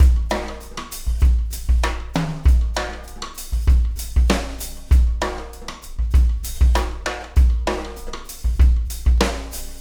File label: Dip Beat